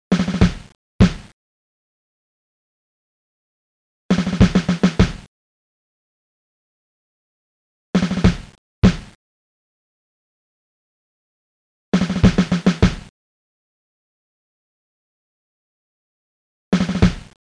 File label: snare; Inside the White House